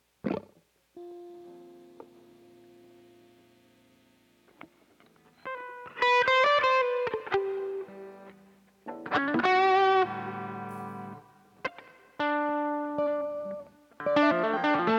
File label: Strings